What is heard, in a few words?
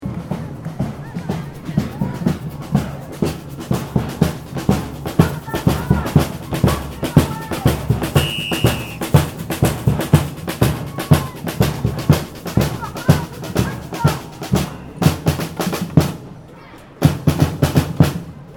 Ambience Melbourne Moomba Parade Latin Group; Moomba festival Melbourne 2009; Parade Sound Effects; Foundation1